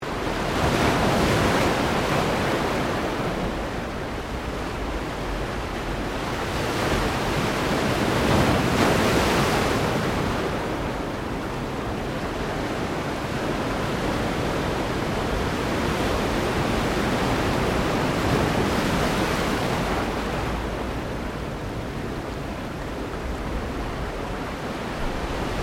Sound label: Water Ocean Waves On Rocks Loop; Elemental weather effects; Nature